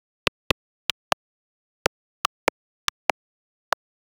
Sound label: futuristic short click, synthesizer